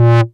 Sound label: Bass Multi Rev B; Vengeance Samples